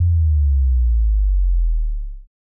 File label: BD Sub; VEC1; Club